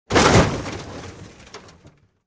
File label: Metal Hit Large